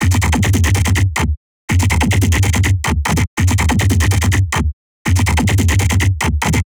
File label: loop chuggy, bass